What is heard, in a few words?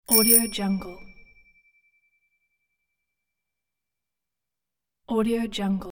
single bicycle bell ring